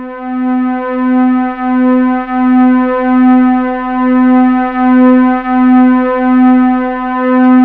MOOG STRINGS; Rubber Chicken Software Sample Bank

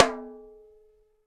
Roto, EMU PX-7 Drum Machines

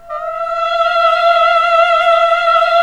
MMH F3, CLASSIC VOX

